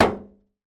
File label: impact vehicle van panel; sound effects one